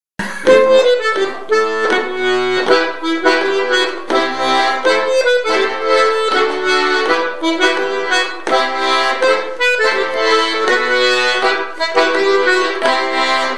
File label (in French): Hanter dro accompagnement 1ère partie; Enregistrements St Maurin; rencontres-2014